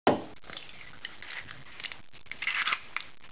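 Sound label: egg break; Image Bonanza; Expert Software; househld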